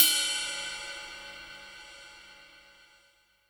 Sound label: drum ride sound G key, Royality free ride sound tuned to the G note. Loudest frequency: 8015Hz; cymbal drum